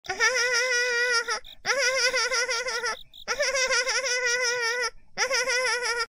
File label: aha hah hah hah